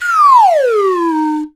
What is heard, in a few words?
SLIDE W, SLIDE WHISTL